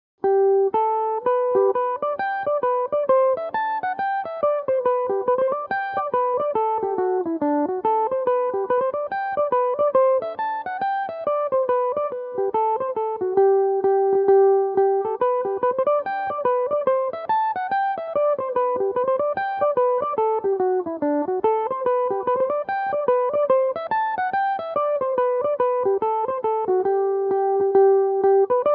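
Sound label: hornpipe, G major, mandolin; Lurgan Hornpipe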